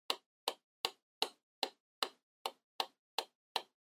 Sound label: Flip Clock Alarm Dial Slow